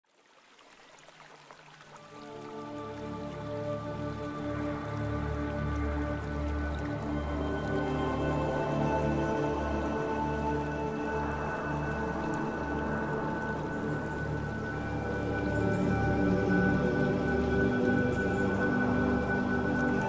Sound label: Meditative Music Sounds Of Flute And Magical Forest; Meditation Relaxing Music